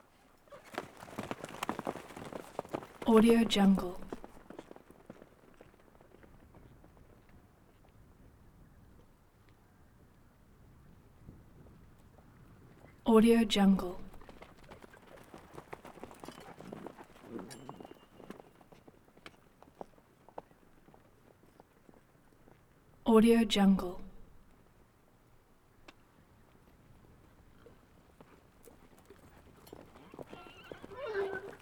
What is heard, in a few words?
military cavalry horse start away up stop x8; game; نزدیک و دور شدن اسب